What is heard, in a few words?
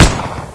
saw Shoot, weapon; Nuclear Tech